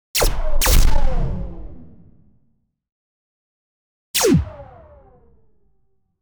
space bullet shot sound, laser

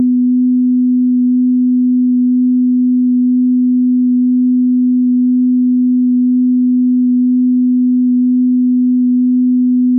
videogame

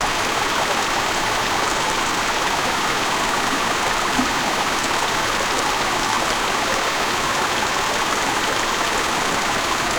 water stream on little river

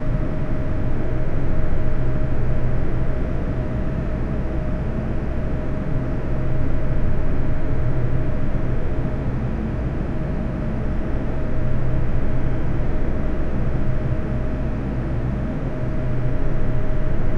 a scifi lab subtle machin, laboratory

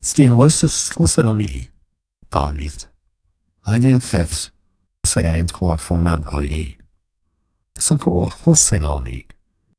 background music

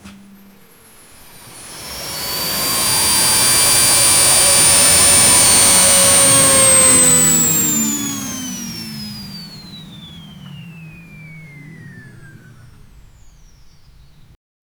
drone dji sound landing

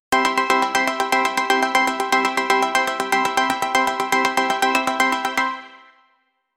light elegant rhythmic un, underscore

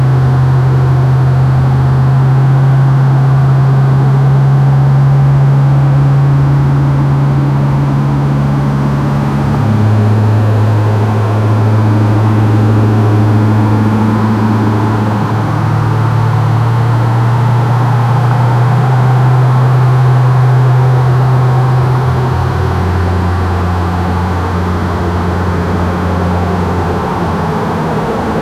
hino cruising sound, car engine; hino ak aj

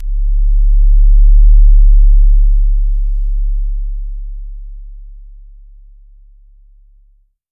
bass fx zoz zoz

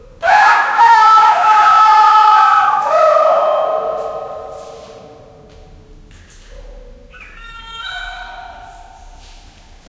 screaming in a cave; bear

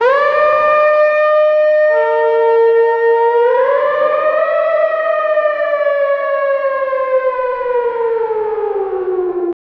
apocalypse nuclear attack

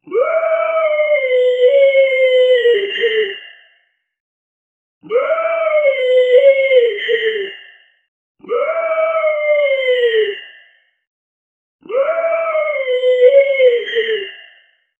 animal cry mix lion

